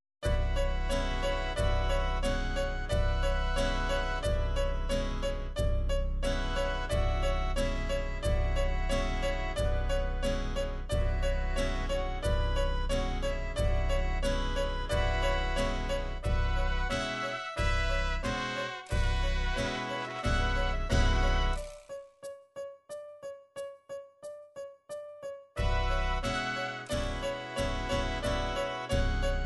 ballad mariachi

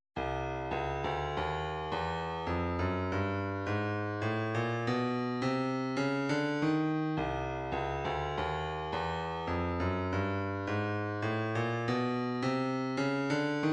137 snare

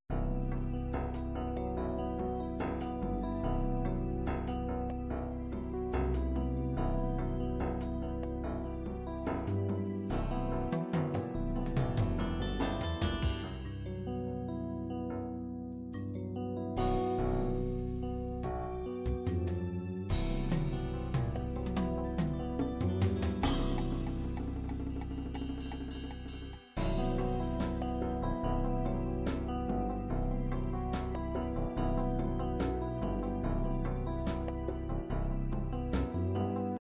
latin bolero, midi

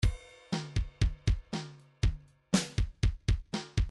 midi file grooves rock

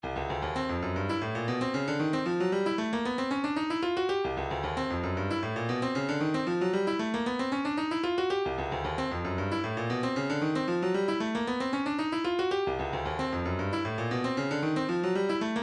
shaker, midi

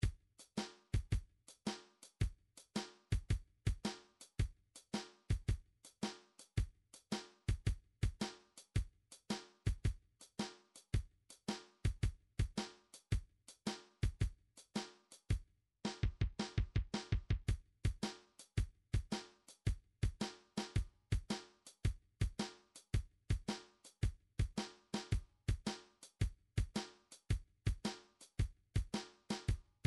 midi drums, shuffle

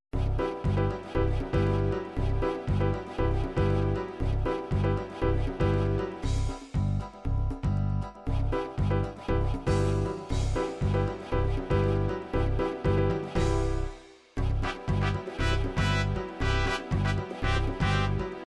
african conga

